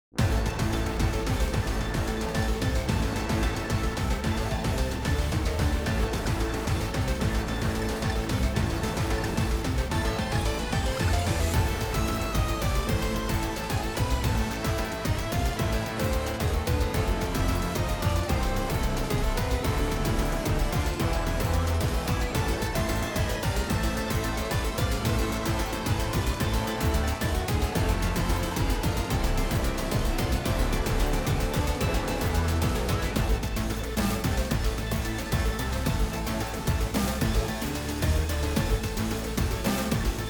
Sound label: Battle Boss